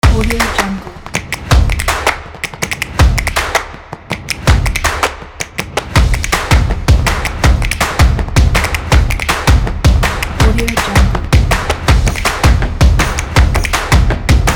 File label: a drum; positive-sound